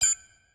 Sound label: beep; FMOD Project; CaiStuff; UI